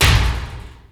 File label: Snare, Alesis D4fx